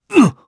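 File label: Damage, Japanese; voices; heroes; Roman; kingsraid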